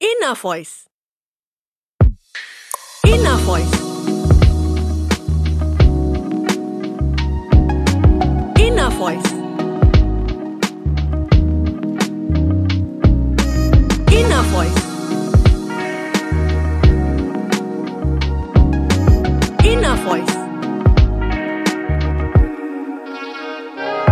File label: elegant lofi